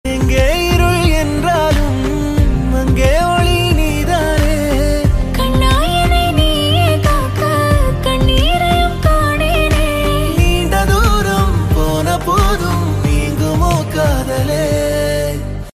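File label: love background music new ringtone; Amaran; Sai Pallavi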